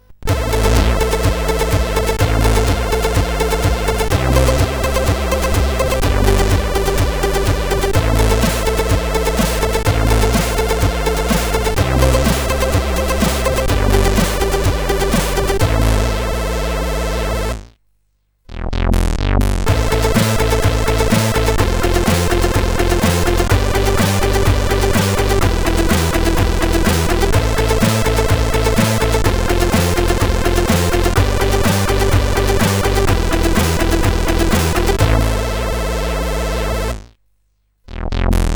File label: Awake to Die, sid CSG8580R5